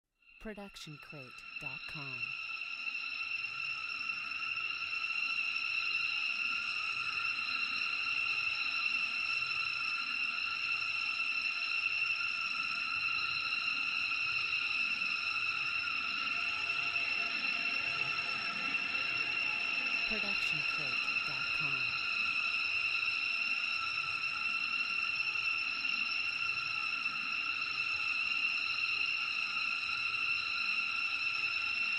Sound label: high swirling tone; soundscrate